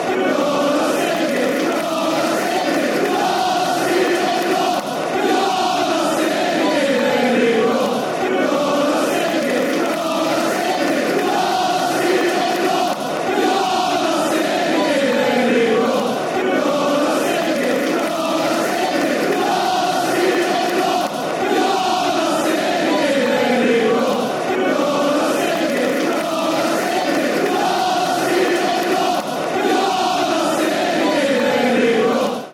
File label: youre not singing, fanchants; swfc